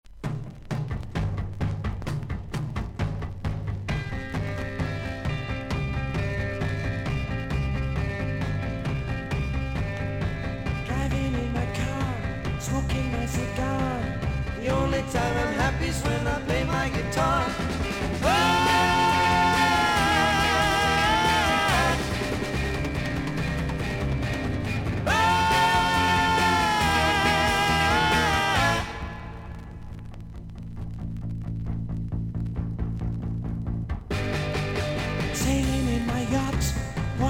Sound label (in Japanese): 少々軽いパチノイズの箇所あり。少々サーフィス・ノイズあり。クリアな音です。; UKロック